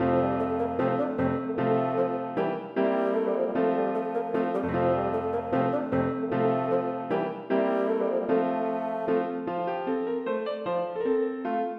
Menuet for fagott og piano; Aamodt

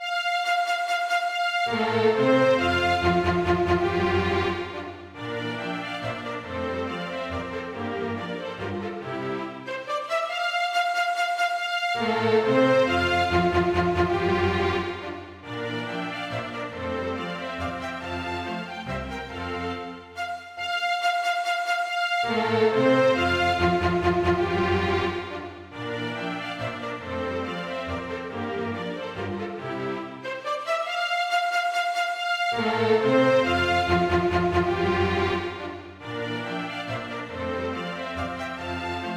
Menue for strykekvintett; Bang

